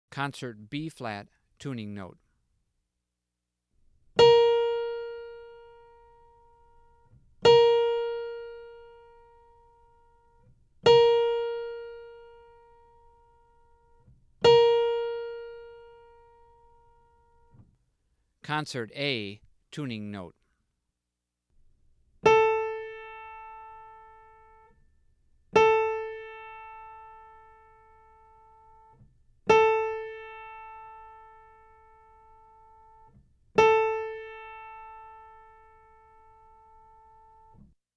Tuning Notes

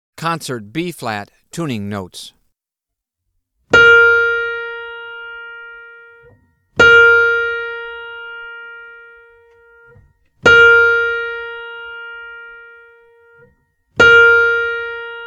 Tuning Notes; James „Jamey“ Aebersold Play-Alongs; Brazilian Jazz – The Latest Trends In Bossa-Nova And Beyond

